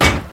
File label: door metal place